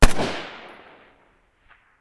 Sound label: RUGER, military; Greytsounds VOL-1